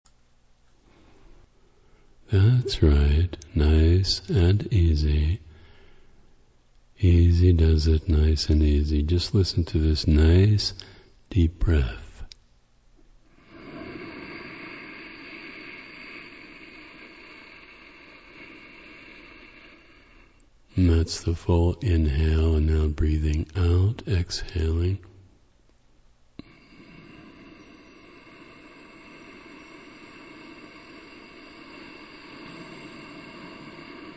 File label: deep breathing